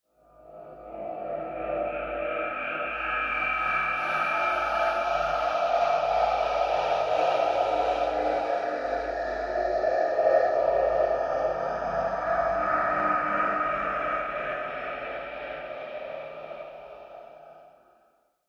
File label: Horror Swell Ghostly; Horror Swell Ghostly Pan Vox; Scary Tone; Blastwave